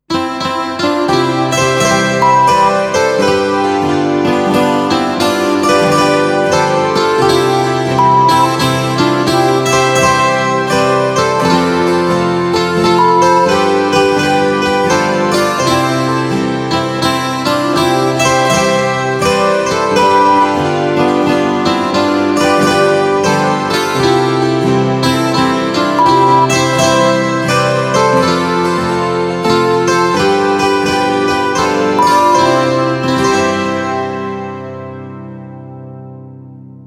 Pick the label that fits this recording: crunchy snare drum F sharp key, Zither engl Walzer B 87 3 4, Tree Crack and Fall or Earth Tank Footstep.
Zither engl Walzer B 87 3 4